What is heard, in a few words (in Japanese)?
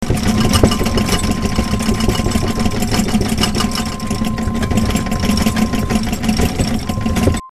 jisin